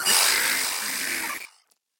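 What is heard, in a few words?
rattly roar; soundscrate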